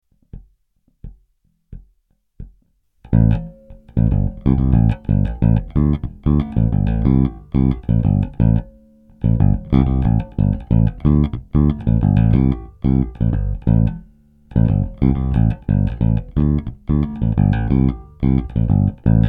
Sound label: bass